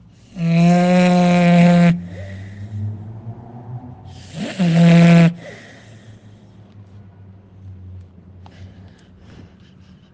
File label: snore